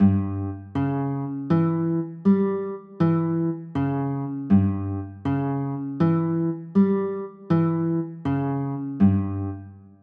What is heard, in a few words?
g dim, chords; g dim7